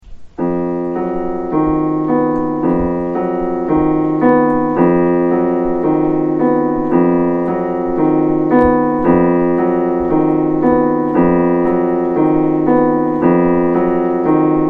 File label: Ostinato; Discernment